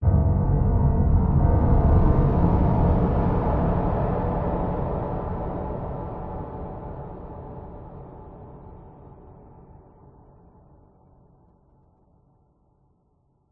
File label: horror hit dark ominous scary